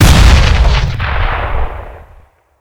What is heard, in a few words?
Wepons Explode